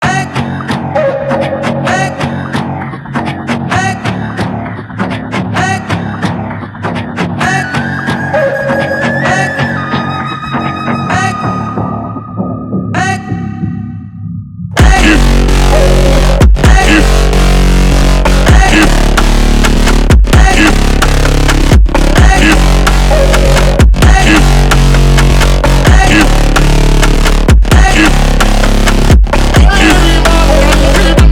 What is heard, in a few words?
Brazilian Phonk